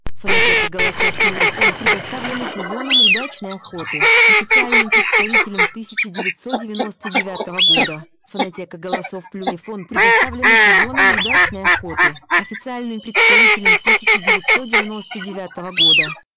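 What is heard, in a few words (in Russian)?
широконоска, манок; plurifon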